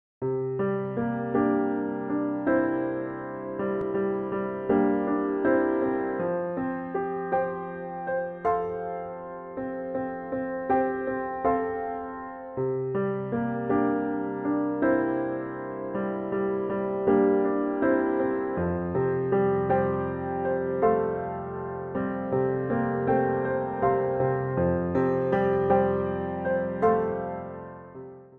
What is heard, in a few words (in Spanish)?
piano sonido